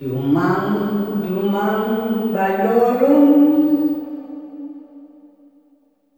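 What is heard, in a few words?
LULLABYE, SOLO FEMALE; Spectrasonics; Heart of Africa 2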